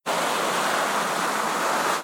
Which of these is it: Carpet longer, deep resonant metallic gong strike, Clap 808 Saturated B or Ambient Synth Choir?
Carpet longer